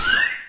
EMU SP 12, drum machines; Kult

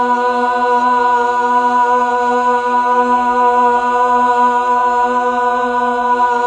Fem B1, Mellotron Female Choir

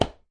tabla

